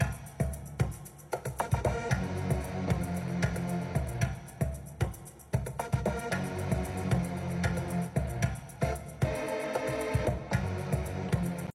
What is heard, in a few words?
Holy Amapiano